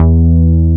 SYBA E3

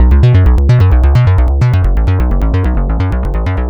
analogue venture B 130, synth loop; Synth Loops; Zero-G; Soundclash Nu Dance Electro; Acidized; 130bpm

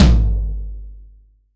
F-TOM 20, PIN STP TOM

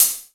HHC VRB, Yamaha RY-10, Drums

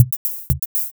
Loop, Drumsynth Drums

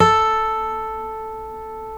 STEEL A, FOLK STEEL Guitars; Spectrasonics; Hans Zimmer Guitars VOL-1

